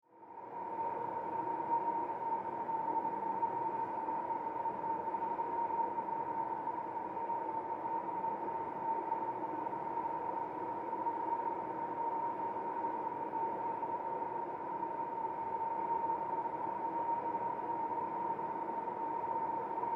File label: Ambience Ventilation Shaft Industry; Factory Sound Effects; Factories (Sound Effects:Backgrounds); SFX Bible 6